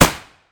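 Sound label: weapon ar suppressed fire player atmo interior; gmod; viper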